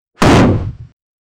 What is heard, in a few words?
a loud thud; physical